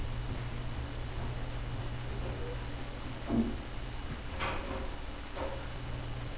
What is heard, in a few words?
bowl